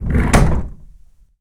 Slide Door Open Strong; d4dj groovy mix; android

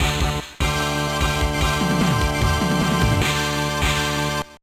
Unknown Fanfare

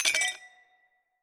Kanpai Glass

